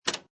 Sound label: Pinball sound, metal clank with return; Video Games